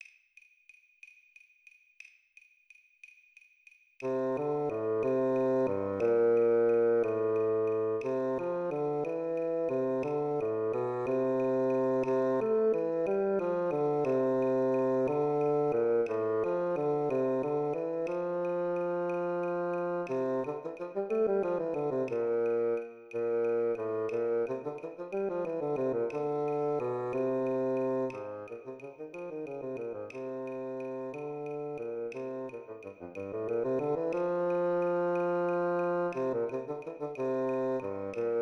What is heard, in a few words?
Bassoon Play Along 60 BPM